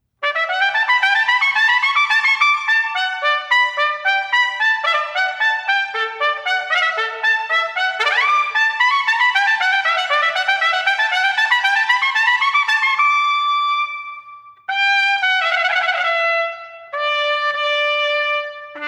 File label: Fanfare, trumpet; Reiche